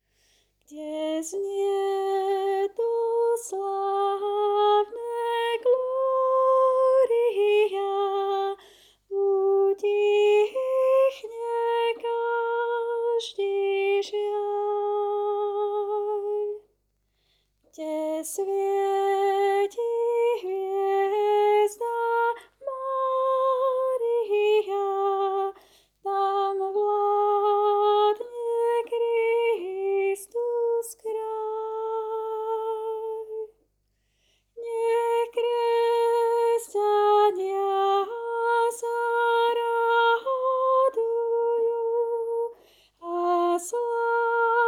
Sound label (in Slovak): Gloria Tenor; spevokol; Znie nad Betlehemom